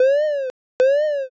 warning light

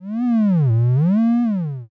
tree creak

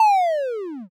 shutdown

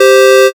buzzer end

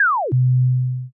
time stop

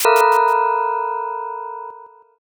sting

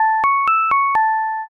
departure melody

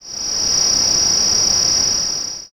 night insects

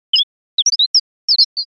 chirp mix

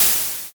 spray

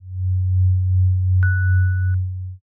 final tension

